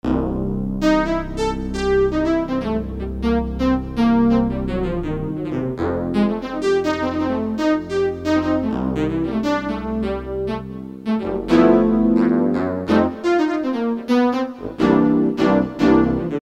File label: DWGS Horn, Korg DSS-1; Brass